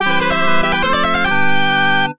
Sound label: Goal; Hit The Ice; Genesis